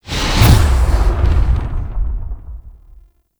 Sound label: Lift Transfer Cast; Nararmon; Focus; Naramon